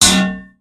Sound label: tank hit small interior; tanks; War Thunder